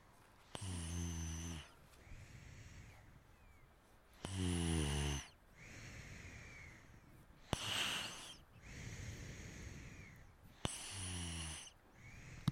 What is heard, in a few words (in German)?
Schnarchen; Schüler-Podcast; Klosterbergschule; Klangexperimenten